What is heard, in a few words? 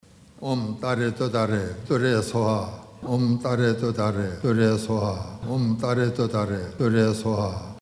Green Tara Mantra